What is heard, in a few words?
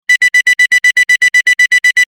alarm clock